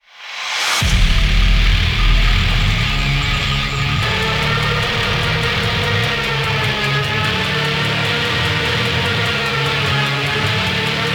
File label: Heavy; Doom; Rust, Dust & Guts